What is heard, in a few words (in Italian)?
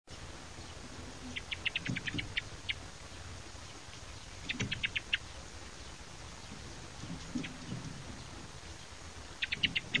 TORDO